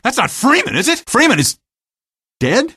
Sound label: death; freak fortress 2; gordon